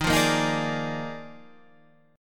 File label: D sharp Major 7th Suspended 2nd Suspended 4th, Guitar, Half Step Down